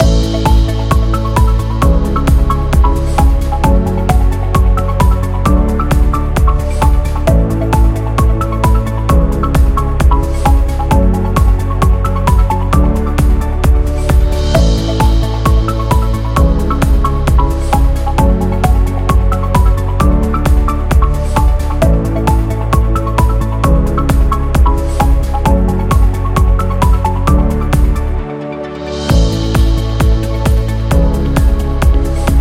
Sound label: Educational Loop